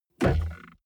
car bmw e46 interior center console open soft

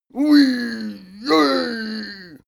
male cheer playful; koerpergeraeusche; menschen; soundpool

